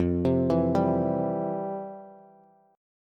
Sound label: F Major 7th Suspended 2nd, F, down, Bass; arpeggiated; JGuitar